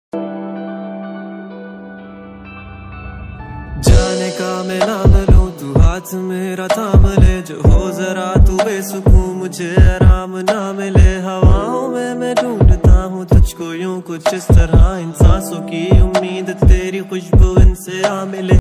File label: hindi rap ringtone